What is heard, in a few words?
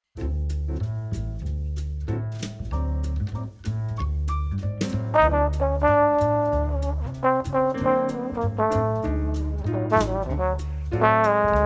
Playing non chord tones over D7